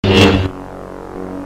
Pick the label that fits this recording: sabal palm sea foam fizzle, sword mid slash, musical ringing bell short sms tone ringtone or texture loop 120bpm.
sword mid slash